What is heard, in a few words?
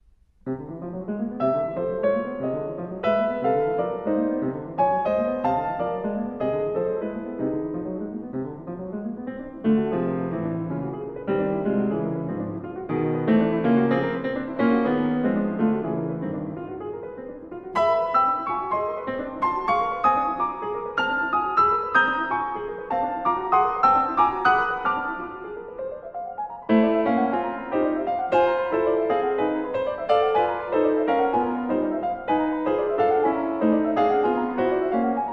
fanfares